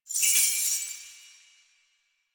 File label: Naginata Whoosh Tonal; Weapons; Melee; Warframe; Koumei Naginata